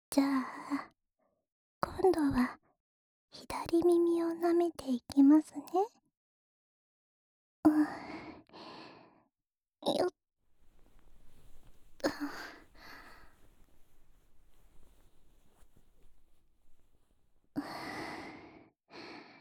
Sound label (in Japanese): 左耳の耳舐め, ASMR; こっちのお耳も、ねーっとりとご奉仕しますねー♪